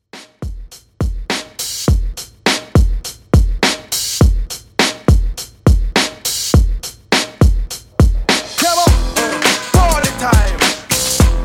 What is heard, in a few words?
Classic Mix 103Bpm